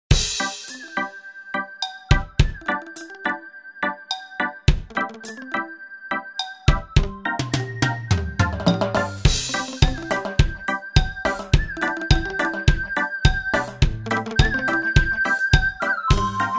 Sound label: Reggae